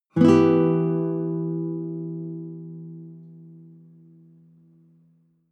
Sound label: nylon guitar chord high minor